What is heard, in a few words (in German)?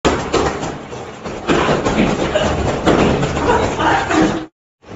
Kloppe